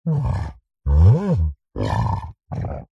horror monster playful curious caring grunts groans